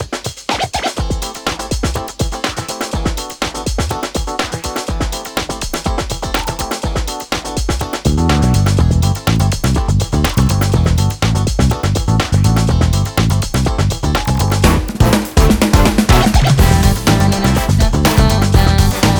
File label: Home menu